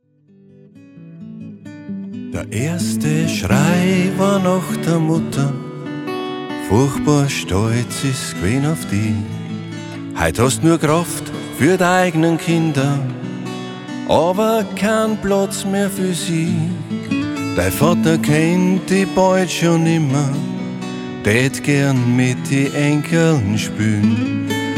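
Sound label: unplugged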